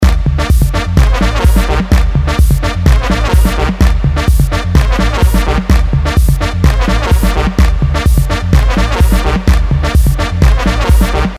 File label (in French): Trompette